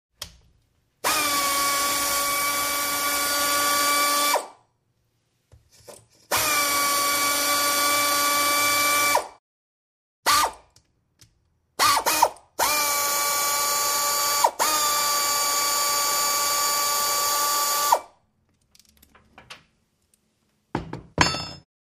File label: Air Tool Ratchet Whir With Tool Movement And Metal Clinks.